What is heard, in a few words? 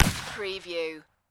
Rifle Thompson Center Encore 209 Muzzle Loader 50 Cal Single Shot At 45ft